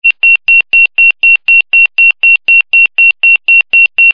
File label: Default Notification; Samsung Galaxy Xcover 4